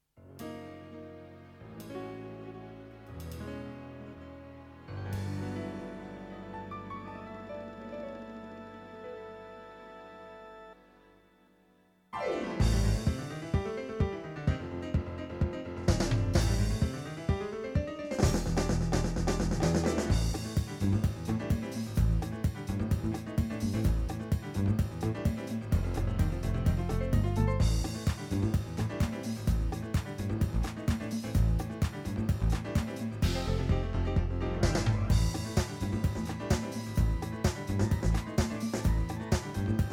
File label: casio px5S